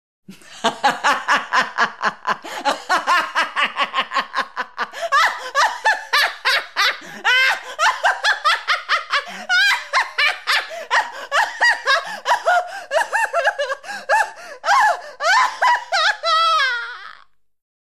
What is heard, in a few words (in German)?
Lachen